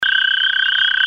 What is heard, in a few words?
American Toad Ringtone